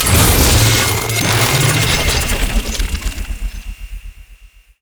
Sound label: cannon spin; LoL; viktor skin03